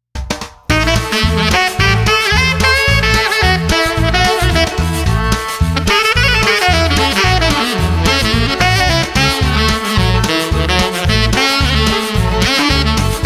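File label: Street Sax Fiddle Skiffle, 110 bpm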